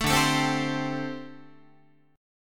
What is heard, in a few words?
D 7th Suspended 4th, down, Guitar; D7sus4; strummed